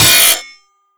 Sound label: manhack grind; Half Life Alyx